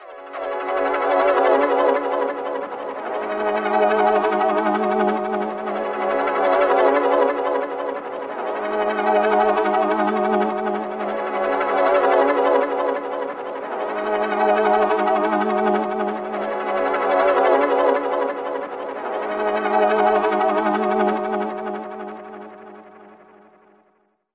flutter by echo synth; SYNTH AND ELECTRONIC

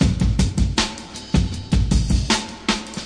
78 sweet groove, drum loops and breaks; 000 to 080 bpm

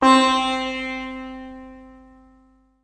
tampura C3; WORLD

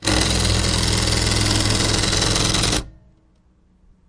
rapid fire tabbing, Typewriter Kit

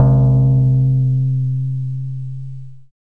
dx100 Timpani C4; DRUM MACHINES

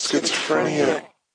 schizophrenia, glitches growls words and tones; Ze Franks Pain Pack

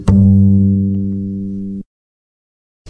Upright Bass Notes

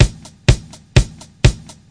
125 bpm kick, drum loop; 121 to 130 bpm

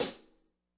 classic hh Str; STYLE KITS (Hip Hop, Etc); Classic Hip Hop Mega Kit

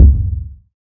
Fracture Kick, Found Percussion Hits; ModeAudio Found Percussion Hits

